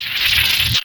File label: Warp Blast Channeling; Evolve; Wraith